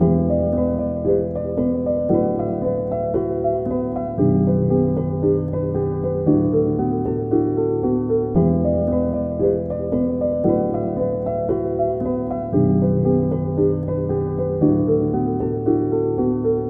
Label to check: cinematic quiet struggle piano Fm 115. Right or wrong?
Right